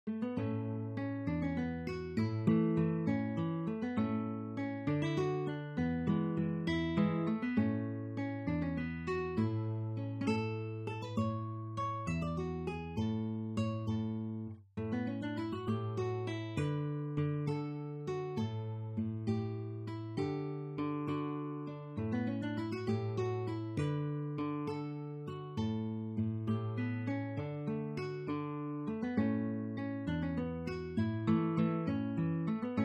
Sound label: waltz, guitar; gypsy waltz